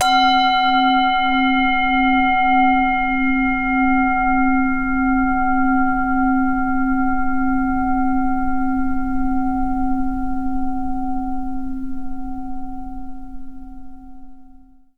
zen prayer bowl; Garry's Mod; tf2; japan fundraiser

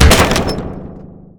bumper car hit; tf2

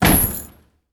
item crate drop

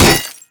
robo impact bullet; physics; Garry's Mod; tf2; mvm

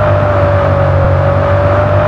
low, vehicles; tralalelo ferrari; sim fphys fer laferrari

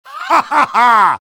heavy taunt flip exert; vo; taunts; tf2

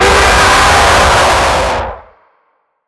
jumpscare; sw dogday; Dognap

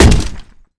taunt launcher hit; Garry's Mod; tf2

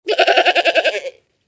goat; brainrot; la vaca blackhole goat

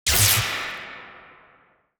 resistance medium; Garry's Mod; tf2

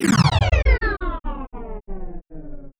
enter performance mode; tf2; garrysmod

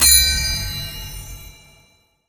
rank up achieved

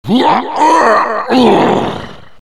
knight death; vo; tf2; garrysmod; halloween boss

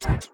Crouch; boxy boo v2; Giftboxy

